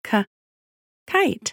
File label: KITE